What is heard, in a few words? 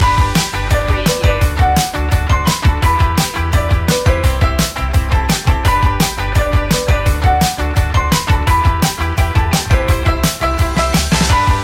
indie people loop